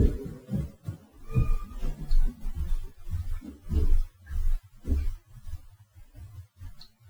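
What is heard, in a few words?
disembodied cat; EVP; Bruce Mansion May 6 2017; Lower Michigan Parnormal Society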